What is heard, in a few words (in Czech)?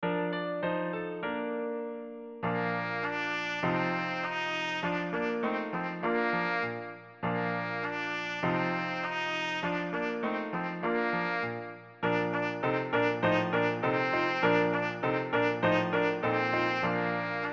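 hore bratri trubka klavir, trumpet piano; Koledy pro trubku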